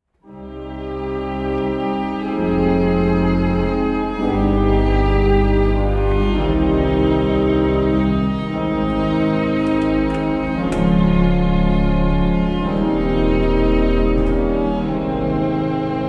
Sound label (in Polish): Preludium c moll; Chopin